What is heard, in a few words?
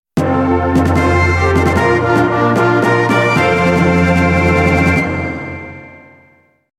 Full Conplete Fanfare